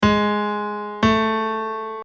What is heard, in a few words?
Interval 8